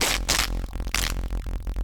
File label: Shock Two